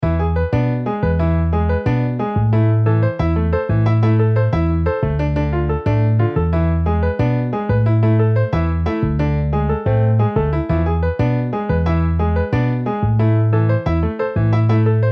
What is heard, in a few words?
Piano Bass MIDI